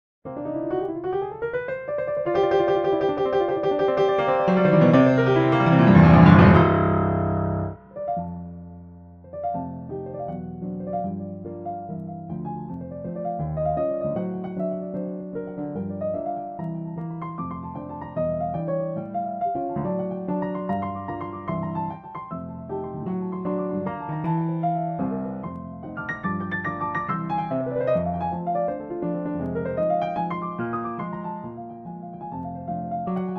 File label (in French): Etude ragtimusante